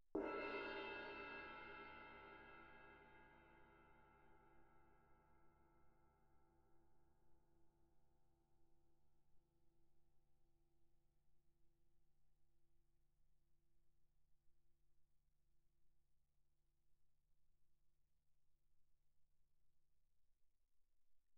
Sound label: crash mallet mf, suspended cymbals; Percussion